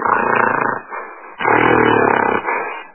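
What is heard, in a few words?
grunting made by a gorilla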